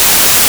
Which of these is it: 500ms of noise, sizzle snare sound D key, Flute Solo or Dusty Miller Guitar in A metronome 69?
500ms of noise